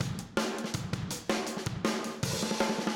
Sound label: Fill Room, Drums, 162 BPM; Drums from the Big Room The Mixes; Take Your Toms Off